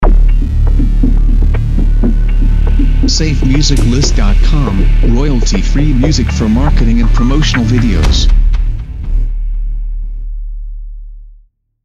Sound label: Intense Dramatic Audio Logo; SafeMusicList